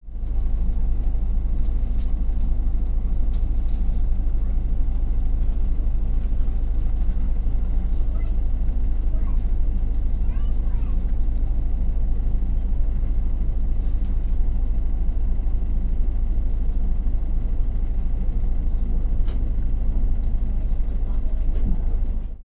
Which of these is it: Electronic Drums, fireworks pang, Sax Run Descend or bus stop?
bus stop